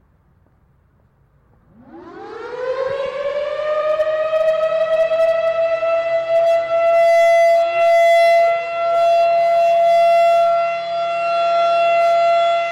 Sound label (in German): Signalton Sirene Entwarnung 1 Minute